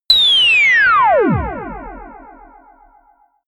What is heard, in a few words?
high ululation ufo